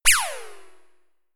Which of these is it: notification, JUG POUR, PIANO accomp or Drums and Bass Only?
notification